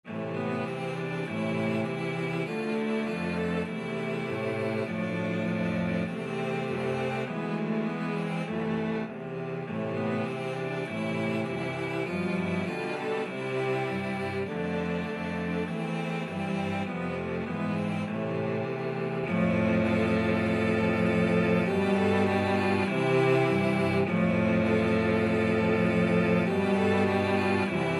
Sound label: cello quartet